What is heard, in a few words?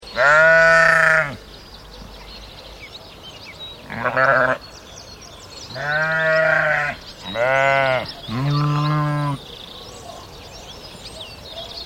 Noisy bleating sheep in rural countryside with bird sounds; Sheep (Sound Effects:Animals)